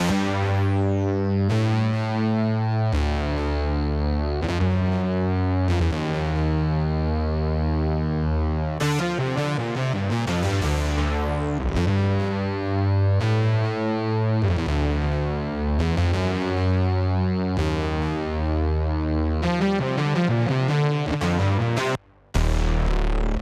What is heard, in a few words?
bass B, Smooth RnB; Big Fish Audio; Legendary; Shes Mine